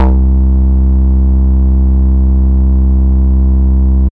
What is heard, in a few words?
boing, synth